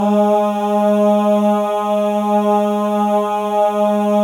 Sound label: MALE AHS, Symphony of Voices; Spectrasonics